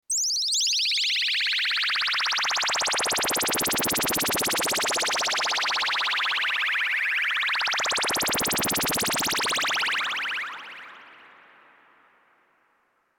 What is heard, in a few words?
FX